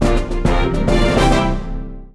Big Switch Pressed; Kirby Star Allies

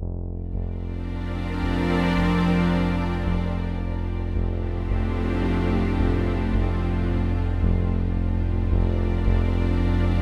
mission ui; Spiral Knights

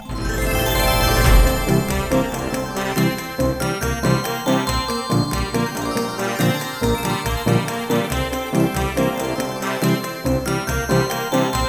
Level Complete; Tiki Towers; android

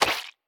Hit Bug; we are the dwarves gamerip 2016

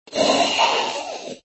Zombie Death; Extra Lives

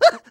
tail fire vocal; Scrat

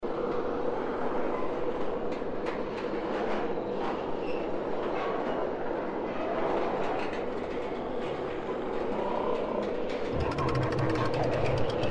Boss Ambience; escape the backrooms; Level 94

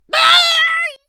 weird effort; Scrat

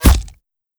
bullet hit player; gamerip; shattered horizon 2009

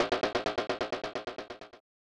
Flammie Drum; Secret of Mana; snes

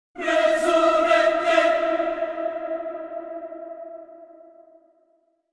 Minigame Failure

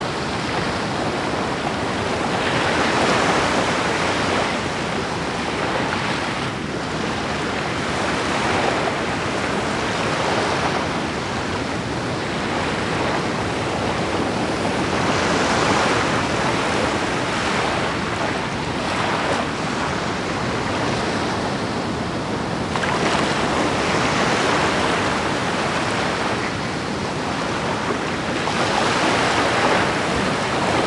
Ocean Coast Bed; Civilization V

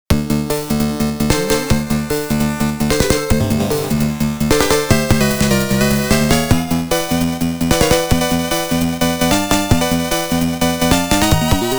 Stage 2 BGM

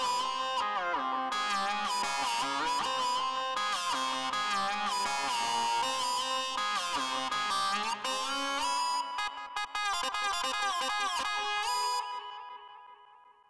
Music Transition Synth; GnomeGnight

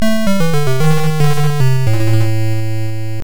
Lose; Arm Champs

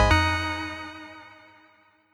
Key Pickup Eb

